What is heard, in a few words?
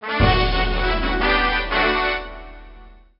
Jingle Birthday; Winx Club Secret Diary 2009; NDS